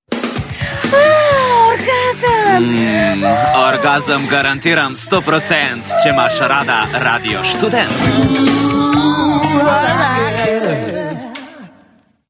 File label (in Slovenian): orgazem ringtone